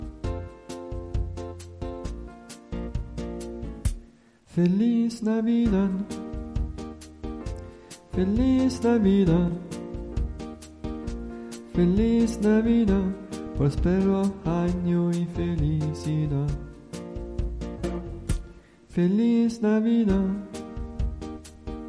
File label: feliz, chants